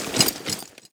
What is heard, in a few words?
suit, footsteps